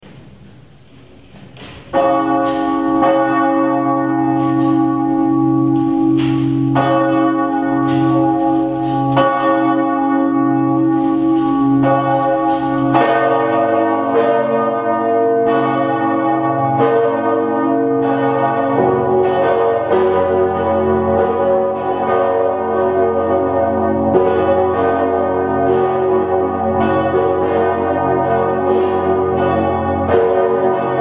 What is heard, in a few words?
Dresden, Kreuzkirche. g-h-d'